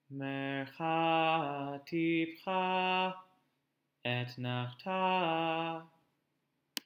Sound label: Torah Trop Pattern